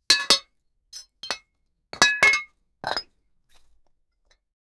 metal cap beer

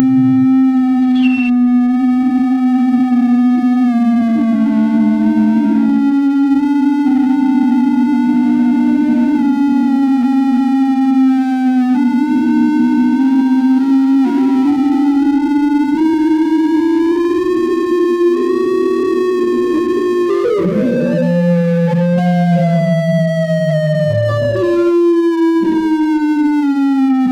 mood emotional gentle bit

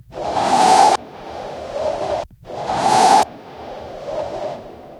wing flap repeated deep; animal

